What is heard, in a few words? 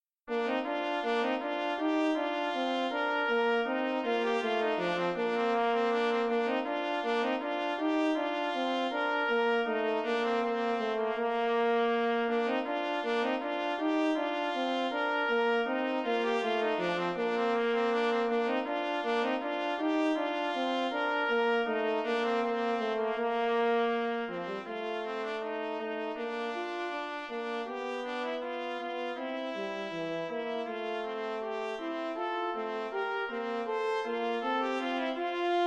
bourree